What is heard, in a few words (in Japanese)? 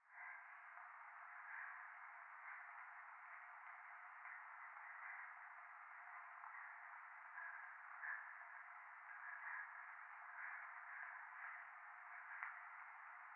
右耳快感吐息 雨; 教会